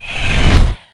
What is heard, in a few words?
banshee phase out; garrysmod